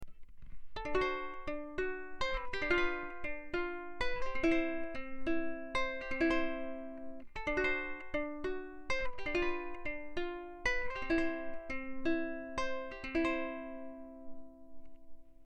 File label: uku